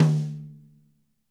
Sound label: MID TOM SON, drums; KITS-LARGE